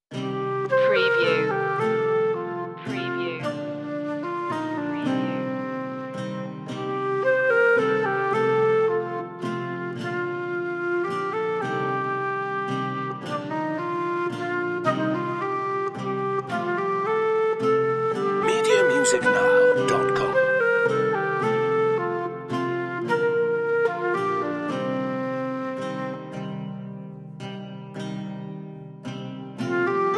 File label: medieval love story less instruments